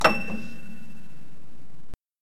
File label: Piano